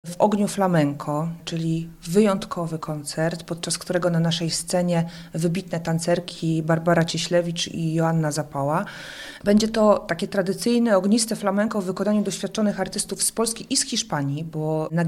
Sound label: flamenco